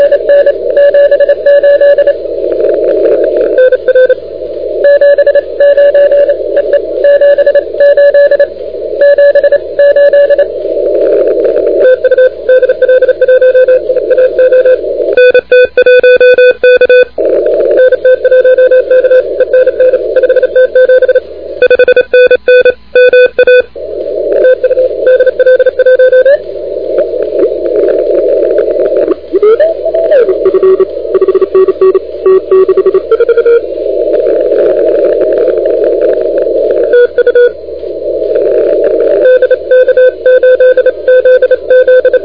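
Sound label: continuous wave 28MHz; ARRL10M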